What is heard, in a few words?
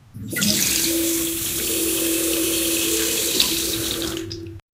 washing your face in the, domestic